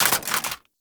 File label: AK47 Reload Mag Drop; Warframe; Tenno; Weapons